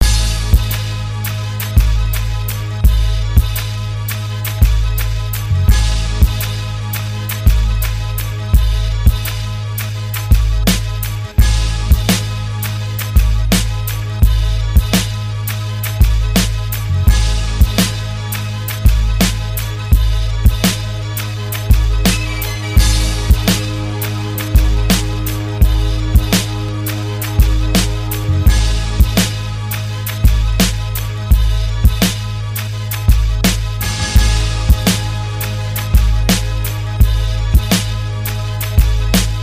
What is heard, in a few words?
beat